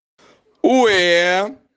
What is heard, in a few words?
ueeeeeh